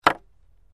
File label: Wood Staff Hits Mediu, spears & staffs